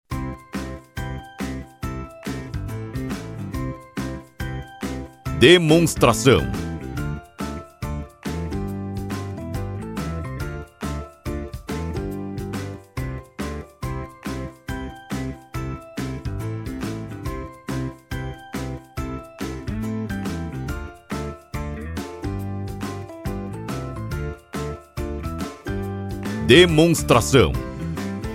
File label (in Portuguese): Trilha